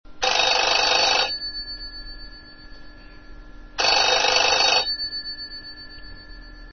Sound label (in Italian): telefono squillo sip